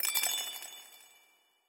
Goal

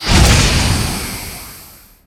Enemy Tower Respawn

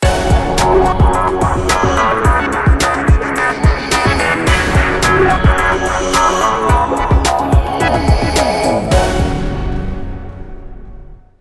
Win; fast furious 6; Android, iOS

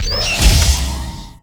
Enemy Jet Active Cardoor Open; gamerip

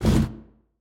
Push

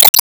Enemy Roadmechanic Summon Minions; disney star smash android ios mobile gamerip 2020

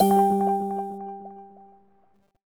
Jingle Time Bonus End; Super Rub 'a' Dub; PS3

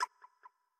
Bat Hurt

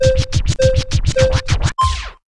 Count Down; subway surfers city sound effects; Android, iOS

